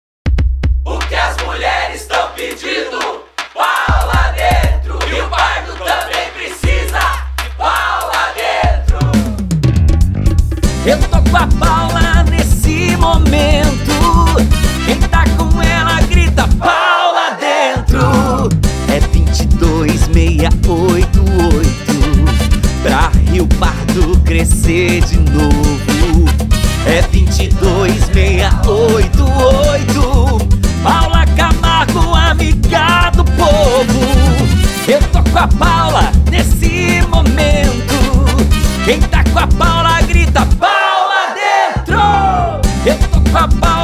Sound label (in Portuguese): jingle